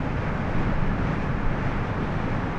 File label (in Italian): Vento, natura